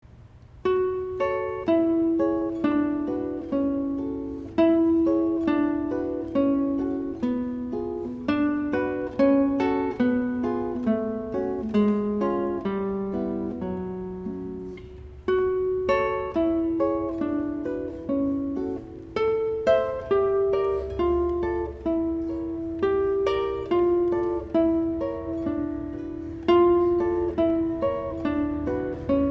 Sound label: Classical Guitar